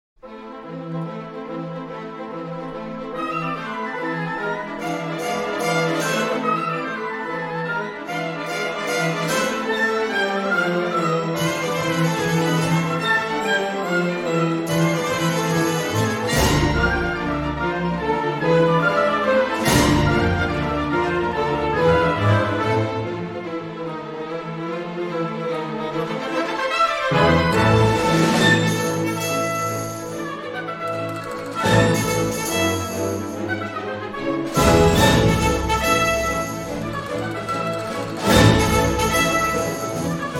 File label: Character Dance Male and Female Tarantella; Boutique Fantasque